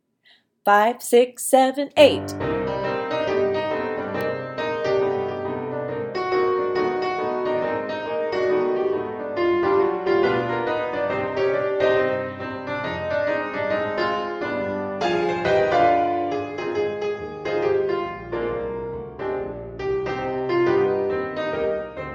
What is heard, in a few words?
Dance